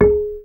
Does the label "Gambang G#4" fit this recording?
Yes